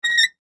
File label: Impact Metal Squeak Short Fast; Materials; SFX Bible